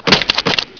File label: clatter